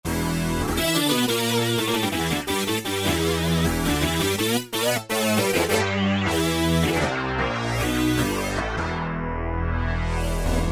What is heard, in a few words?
E-mu Morpheus